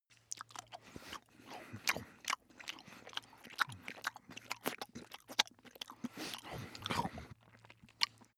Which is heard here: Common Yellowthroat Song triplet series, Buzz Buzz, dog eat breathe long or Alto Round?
dog eat breathe long